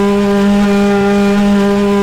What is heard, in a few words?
in PLOW; Japan; AOS F1 2022